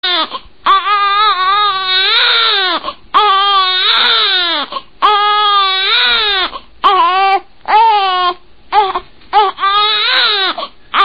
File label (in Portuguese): Bebê Chorando